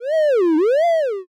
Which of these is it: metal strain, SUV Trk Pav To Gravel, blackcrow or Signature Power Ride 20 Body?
metal strain